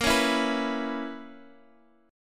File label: B Major 7th Suspended 2nd Suspended 4th A sharp